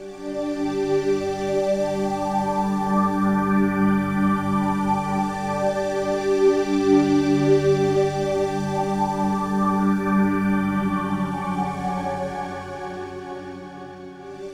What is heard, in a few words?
Pad; Dance; Pads